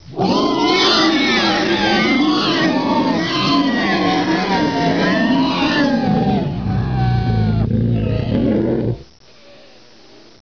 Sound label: Robot Scream; environ; Gunlok